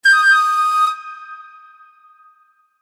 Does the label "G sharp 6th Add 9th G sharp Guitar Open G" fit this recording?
No